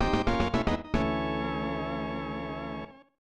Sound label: Failure; Pilotwings; SNES